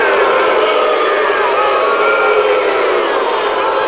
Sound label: Baseball Crowd Boo Loop; Crowds